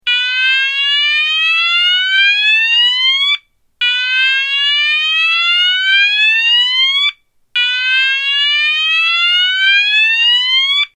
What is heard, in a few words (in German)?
Ton